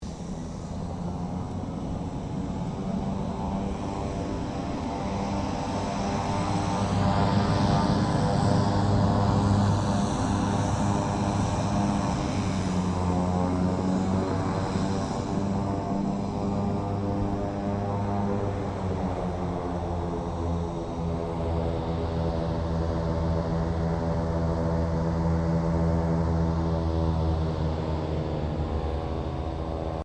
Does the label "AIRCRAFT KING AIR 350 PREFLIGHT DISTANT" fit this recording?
Yes